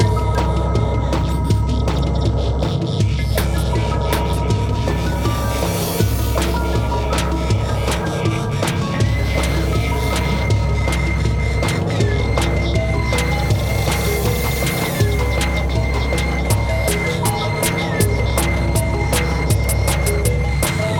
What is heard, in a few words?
Better Run loop; Survival Metroidvania Vol1